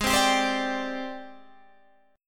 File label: G sharp Major 7th Flat 5th Guitar Half Step Down